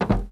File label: Glass footstep